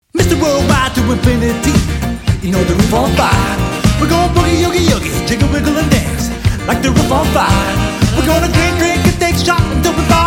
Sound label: medley 5 piece